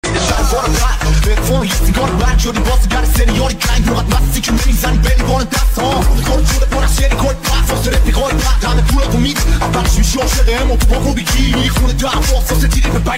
Hip Hop Remix Bass

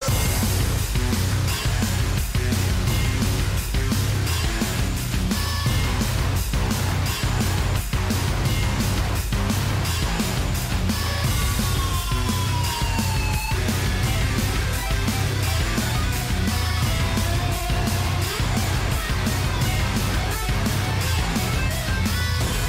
Mobile Ringtone

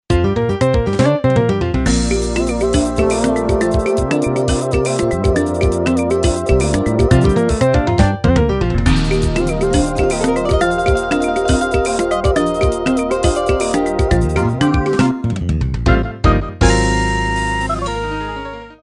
Mobile Ringtone

